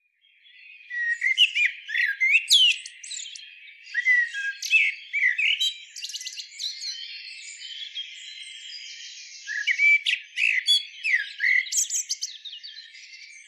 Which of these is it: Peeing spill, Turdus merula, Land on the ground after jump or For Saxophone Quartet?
Turdus merula